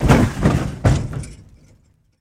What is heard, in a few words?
Metal Impacts Sever